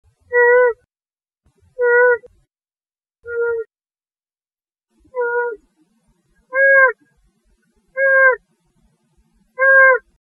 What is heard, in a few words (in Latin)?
bubo virginianus